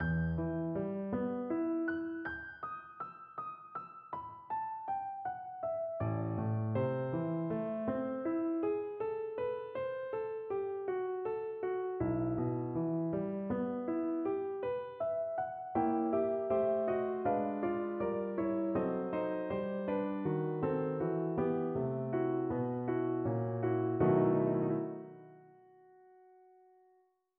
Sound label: Piano Concerto slow movement; Beethoven; Piano Concerto 4